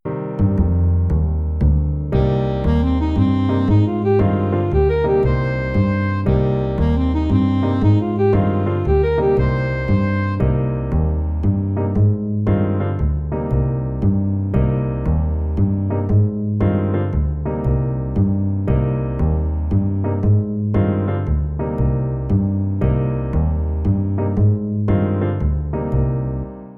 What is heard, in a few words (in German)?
Pentatonik Moll aufsteigend Swing; Begleitung im Shuffle; Registerausgleich